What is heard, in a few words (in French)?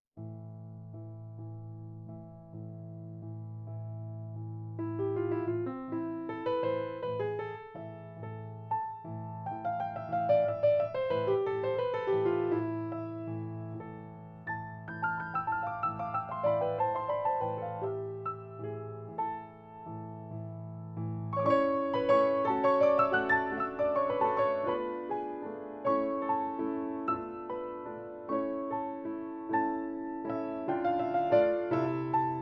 Valse; Marie Jael